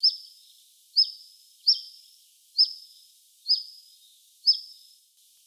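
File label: common chiffchaff voice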